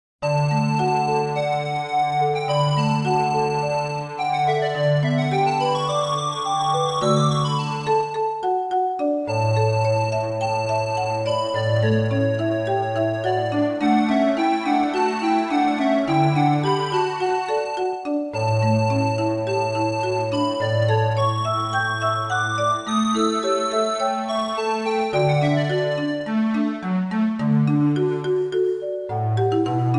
Forest BGM

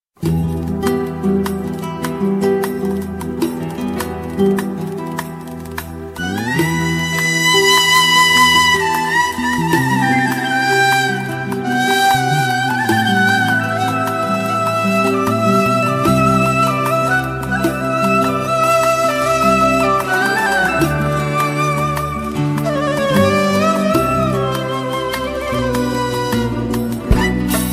Turkis Music

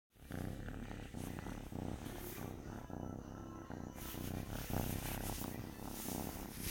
Kitten purr

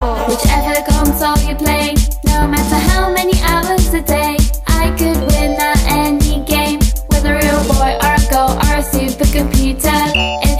beat

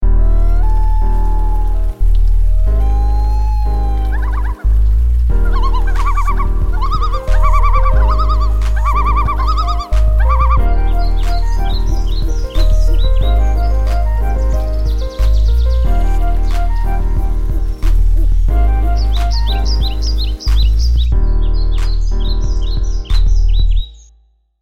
Loon Lullabye

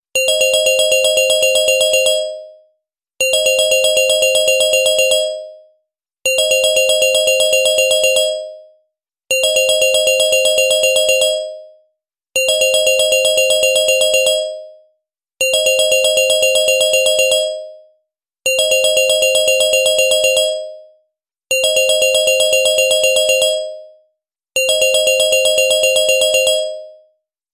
Bell Ring

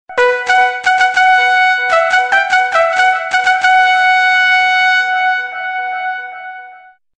Fanfare

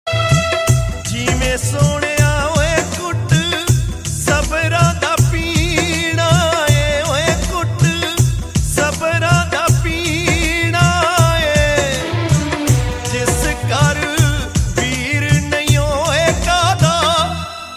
Punjabi

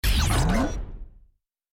Transformer Sms

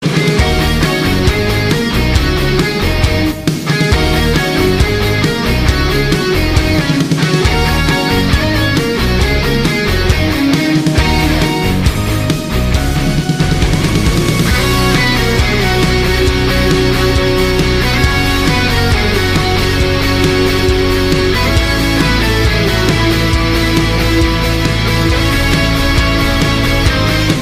Guitar Cover; Ben 10

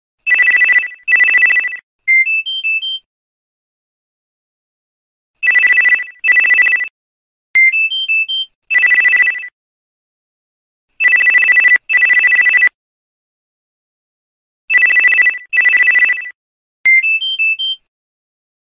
Office Ring; H T C

